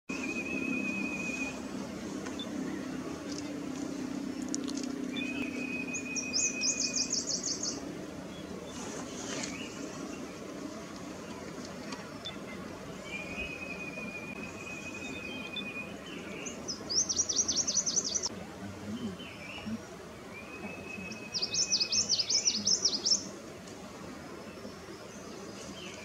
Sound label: Babax lanceolatus; پرنده باباکس چینی